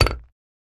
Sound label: Metal Hit Wooden, small impacts